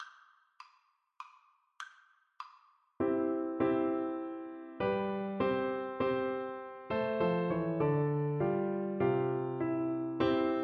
christmas tree FL kar, Flute

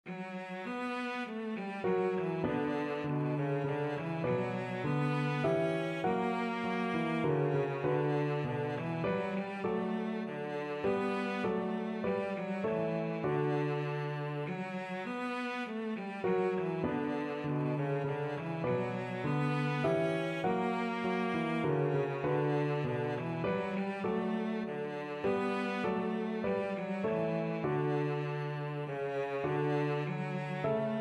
Minuet, cello; Purcell

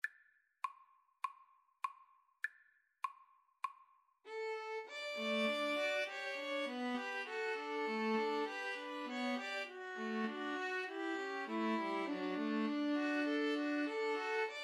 blue bells scotland, string trio

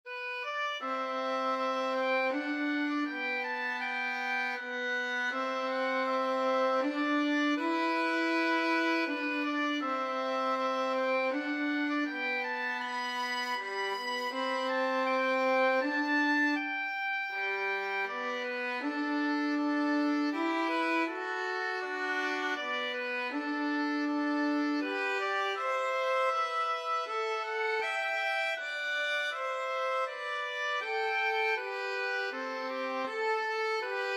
holst jupiter, oboe violin

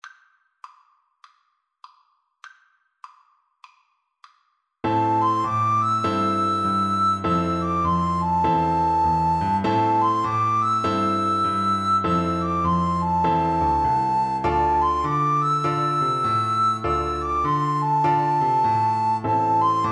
Recorder Duet

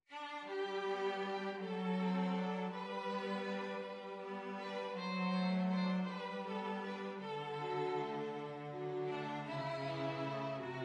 plaisir martini, string trio

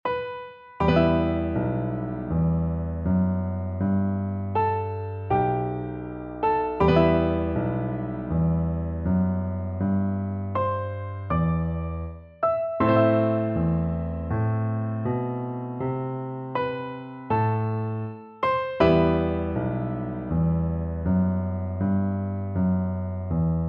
bizet perl fishers, piano duet

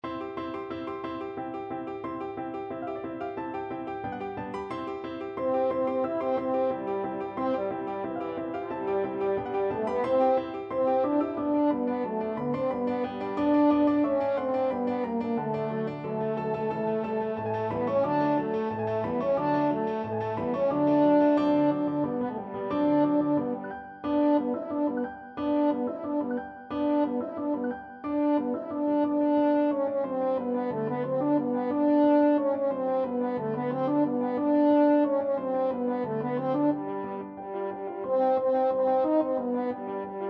fin chhan dal vino, french horn

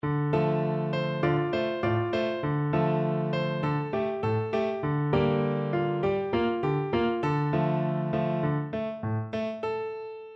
horn, French Horn

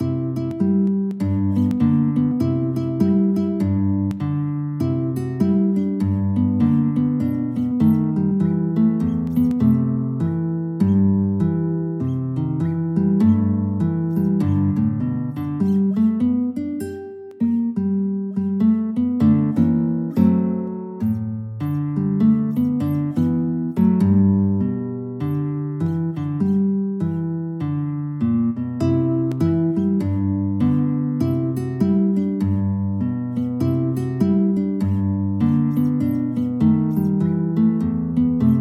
Blues, guitar; The Hesitating

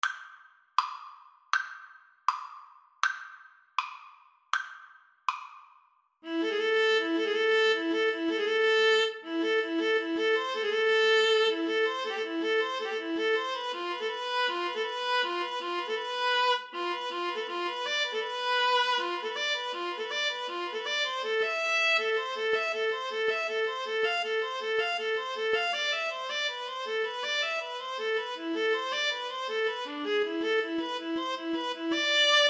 viola duet

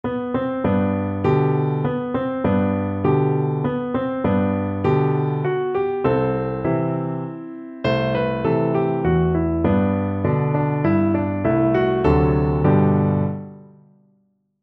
dona blanca, piano duet

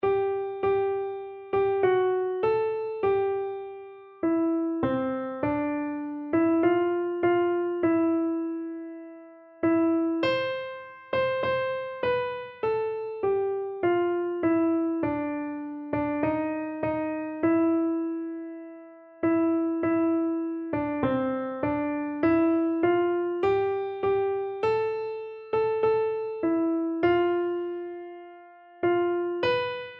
beneath the cross, keyboard

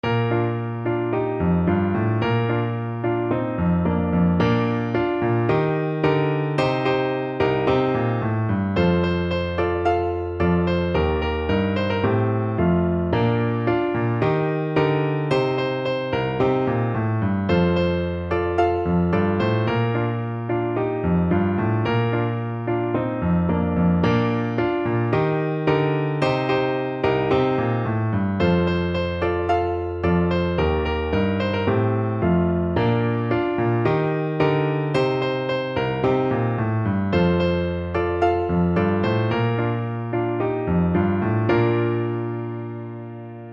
horn, French Horn

